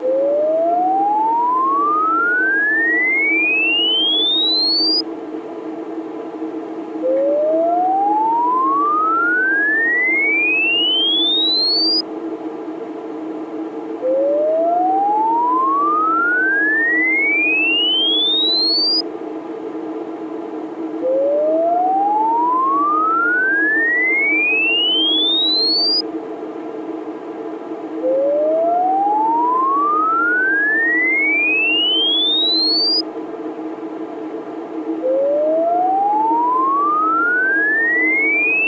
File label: sweep 500Hz 5kHz; S1 trapez RCF Vecchia; Mic2 driver; LaSpezia